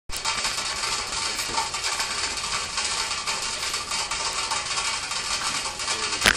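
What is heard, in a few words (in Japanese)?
yakann, kettle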